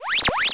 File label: Heal, arcade